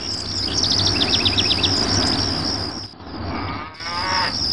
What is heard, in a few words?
mediev, ambient; lomax